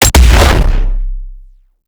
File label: shotgun fire